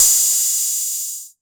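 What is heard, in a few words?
TR606 Hat Open, Roland TR-606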